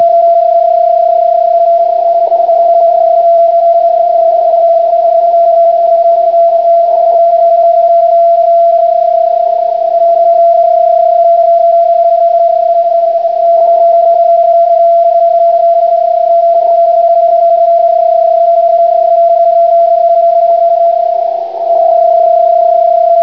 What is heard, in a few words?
psk31